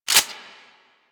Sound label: Shotgun Pump Mech; Crp Shotgun